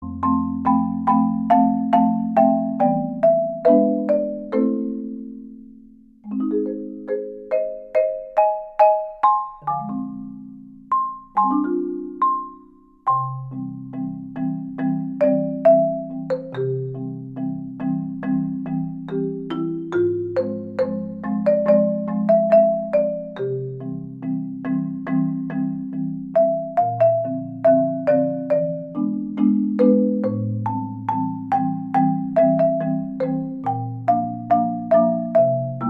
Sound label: Marimba cover